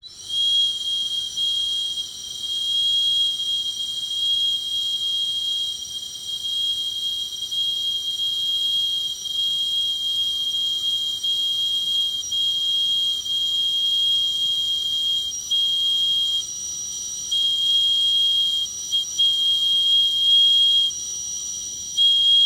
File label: Birdwhistle, clay, painted, dry, airy, wispy, constant, long, sustained, few dips; Eiravaein Works; Vaeyan IV